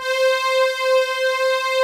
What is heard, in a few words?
ANALOG PAD; TR PADS; Pro-Rec - Synthsonic